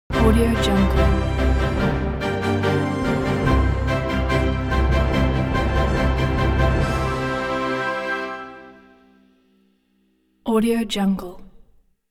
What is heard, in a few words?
royal entrance logo; charlesriverdigital